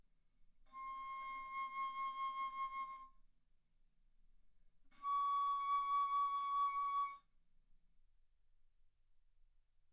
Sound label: Bass Flute ff C6 Db6